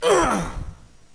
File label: bump; alienarena; femforcer